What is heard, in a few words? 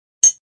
Clink, Metallic; Metallic Sound Effects